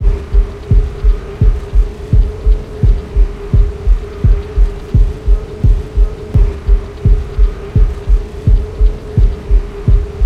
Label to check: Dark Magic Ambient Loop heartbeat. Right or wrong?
Right